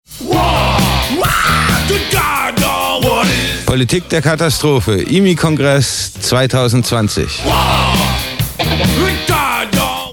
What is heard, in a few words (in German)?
Kongress Jingle kuerzer; IMI; Politik der Katastrophe